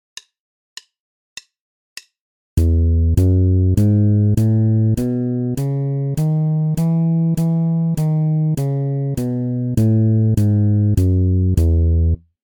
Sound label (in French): Gamme majeure de Mi, basse; exercices